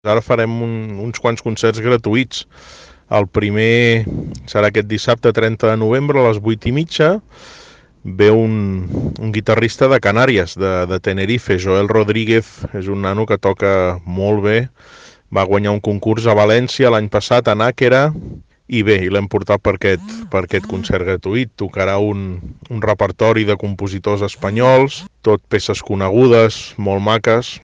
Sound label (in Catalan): guitarra; nova radio lloret